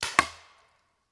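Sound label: Paintball Gun Impact; Sound Ideas; Guns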